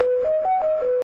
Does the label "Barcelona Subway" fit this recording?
Yes